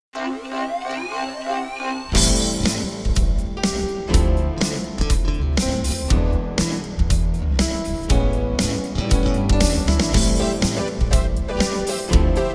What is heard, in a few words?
Funky Time